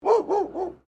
Animal Dog Labrador Bark; Various domestic dogs; Dogs (Sound Effects:Animals)

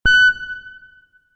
Multimedia Event Notification, beep sound effect

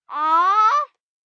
Whine Aww, 1 child, 6 yrs; Whining; VoiceBox

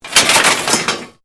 METAL CRASH; Materials

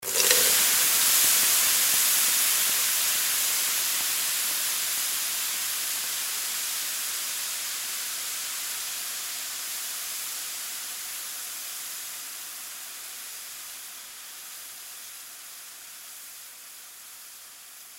Grill Water Splash Sizzle Cook; Industry Grill Water Splash Sizzle Cook Restaurant; Food Prep; Blastwave

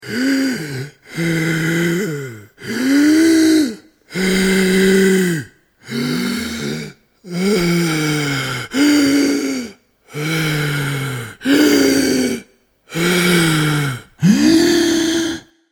Wheezing, Man suffering with breathing difficulty; Human

